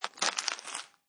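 Grab Plastic Wrap Food, Plastic food wrapping or package such as chips or cookies crinkle; crinkle sounds; Crinkling; Crinkling Sound Effects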